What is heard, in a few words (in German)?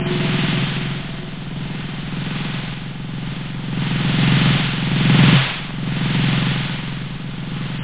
wirbel, whoosh